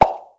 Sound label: pop